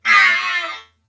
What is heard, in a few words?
death